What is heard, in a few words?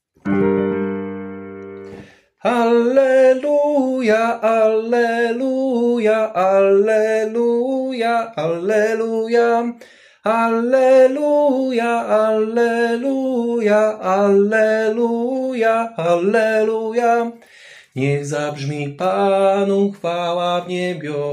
tenor; alleluja niech zabrzmi Panu; nagrania glosow